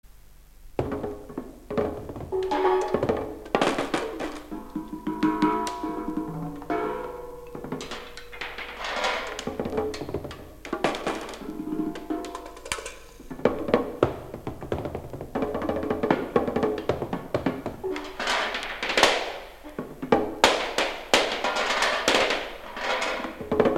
Drums

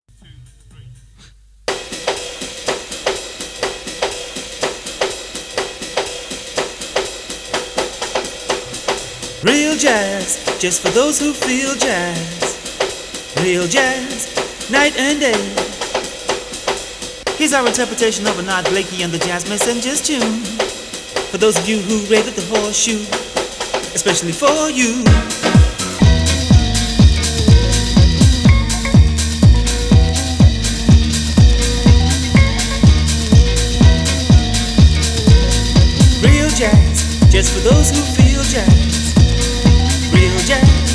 Future Jazz Mix

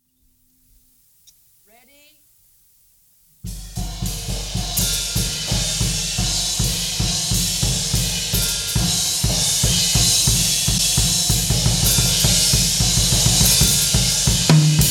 Drum Improvisation Live

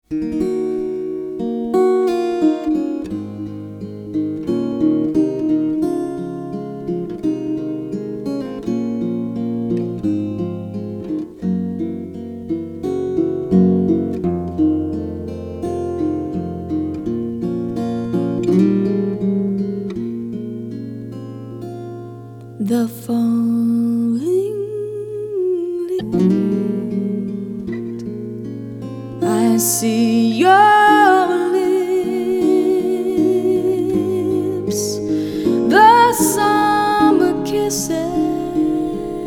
Acoustic

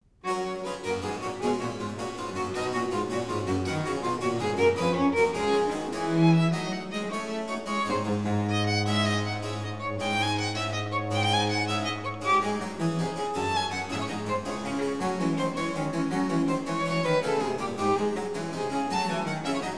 Keyboard Sonata in A Major Allegro; Kk 342

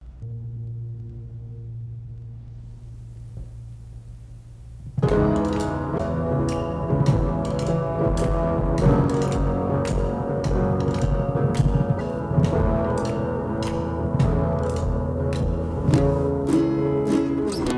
Instrumental

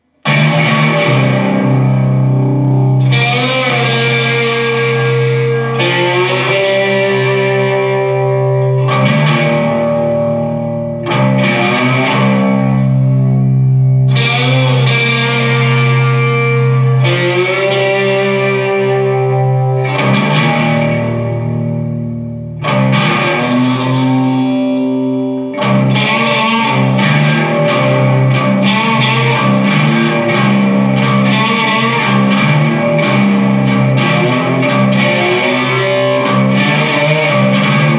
Blues

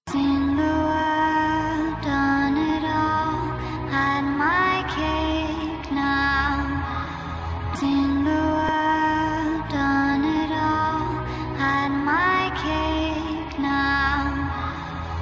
instrumental

